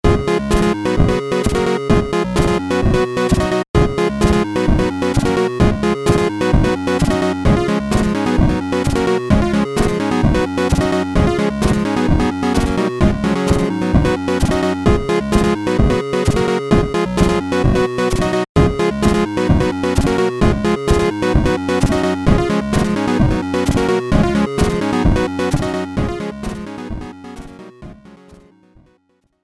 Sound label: Beepbox